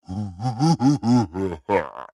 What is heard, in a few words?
Spin Wind Up; Baron